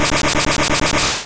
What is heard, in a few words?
Sonicboom; Pokemon; Gen 2; attack moves; GSC